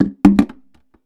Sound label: plastic bucket drop; tools Bangs